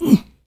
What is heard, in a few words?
grunt; running back